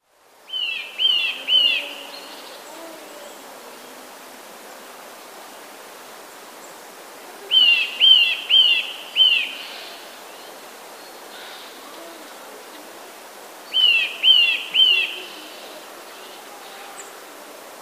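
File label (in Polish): kowalik nawoływania; nawoływania kowalika (a może jest to jakiś rodzaj śpiewu), które mają charakter opadający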